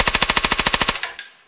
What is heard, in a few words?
silencer burst